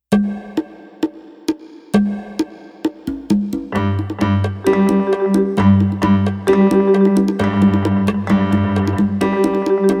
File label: bongo drummers